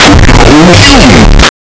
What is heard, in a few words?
really calm relaxing music